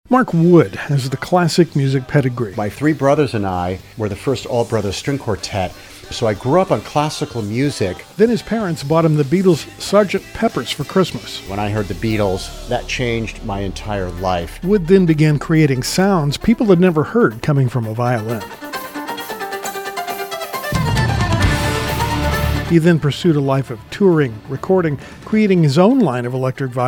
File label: Wood Violin